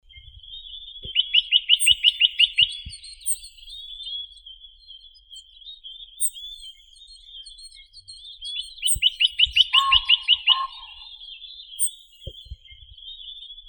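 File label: nuthatch, Sitta villosa; popolzen kosmatii; zvuki prirodi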